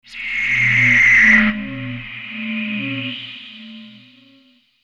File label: Aliens Monsters; FXHome